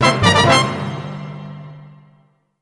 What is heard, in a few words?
Level Up Sting; dragonology 2009 nds gamerip